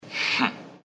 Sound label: hmph